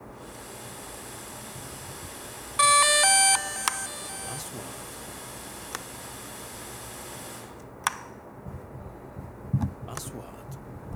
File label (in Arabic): صوت تشغيل درون مافيك; sound effect; shooting